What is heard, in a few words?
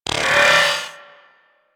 Arachnoid Camper Step Movement; Warframe; Corpus